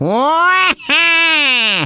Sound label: happy; tf; waluigi